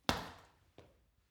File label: punching bag kick soft